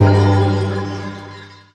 Pickup Magic; SFX Kit; Pickups

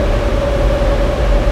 Machine Generator Loop

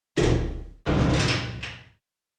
prison; Inquisitor 2

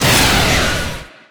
Rocket Launcher Shot; SFX Kit; Weapons

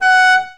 Auto Horn Foreign; Automobiles

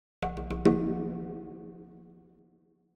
Jungle Drums Triple Hits - Stronger Final; Hollywood Edge; sound designer's toolkit v2

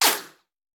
Bullet Ricochet; Sound Ideas; Guns

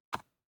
DICE on Cardboard, Grab, Standard, 2 Two Dice; Samples; ArticulatedSounds; DICE Sfx Party (Extended)

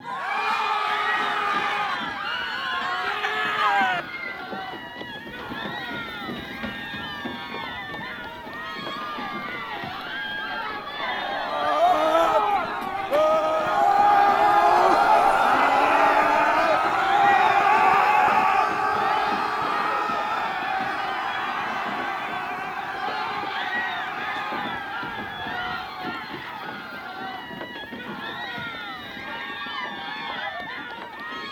Crowd Loop; PFork

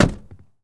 Jeep Wrangler Door Close; Trucks

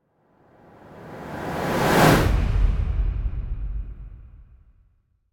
Whoosh Big Ending Air Transition - Strong Long; Hollywood Edge; sound designer's toolkit v2

